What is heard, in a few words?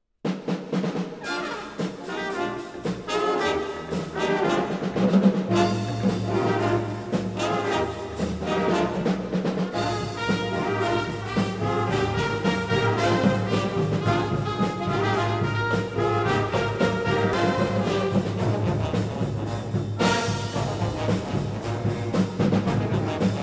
Funk, pep band